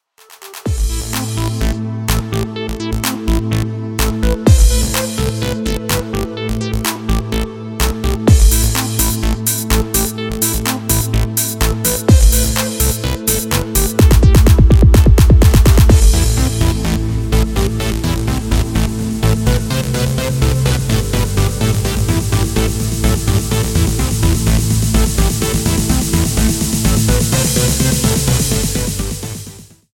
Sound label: instrumental